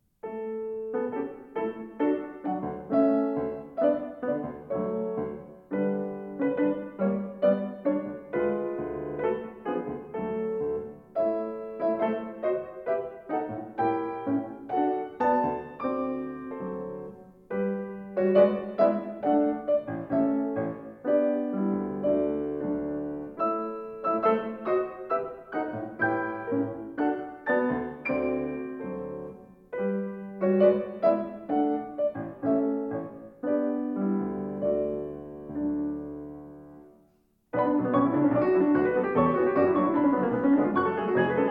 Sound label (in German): Ungarischer Tanz D-Dur; J. Brahms